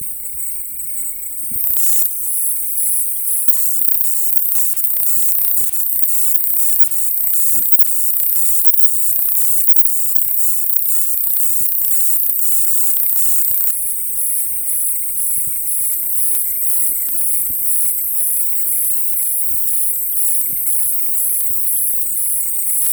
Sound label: Omnimorph wireless keys on Asus